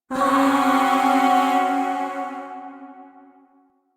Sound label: Zariman Void Shawzin Hirajoshi Chord